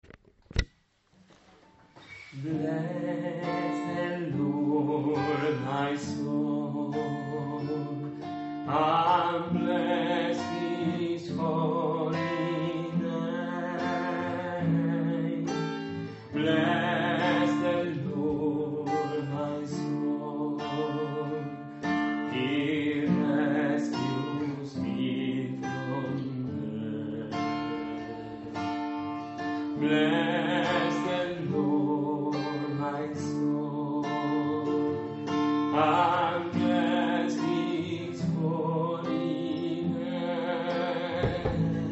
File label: contralto; Bless the lord